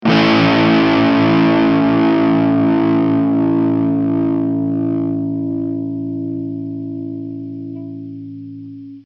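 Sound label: Notes Distorted A major; Hylics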